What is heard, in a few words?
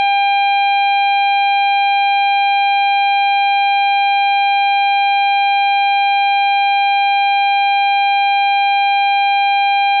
ac power harmonics, Aircraft A320 - 321